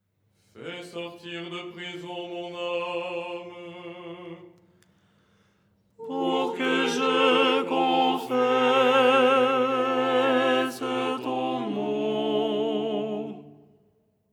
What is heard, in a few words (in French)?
tenor; Verset : "fais sortir de prison mon âme..."; Les 8 tons en français